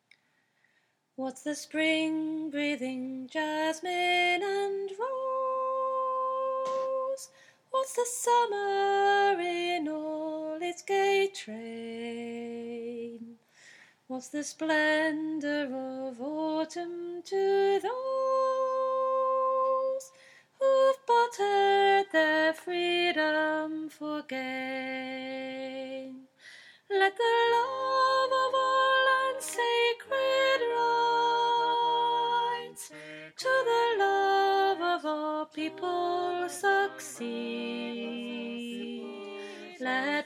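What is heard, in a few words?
soprano; both sides of tweed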